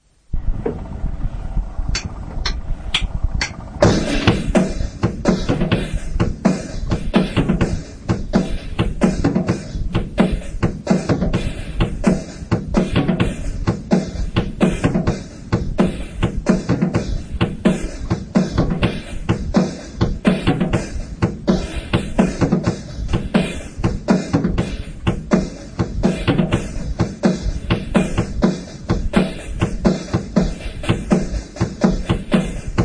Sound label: At the Drums